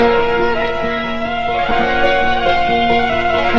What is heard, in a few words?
keys, jazz loops